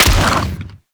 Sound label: Inf Vomit Gun Frenzied Shot; Infested; Weapons